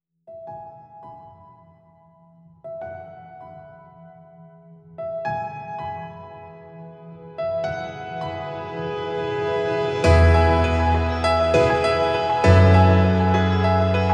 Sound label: piano